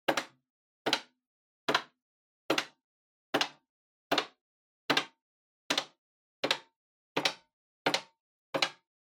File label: Public Phone Button Single Mid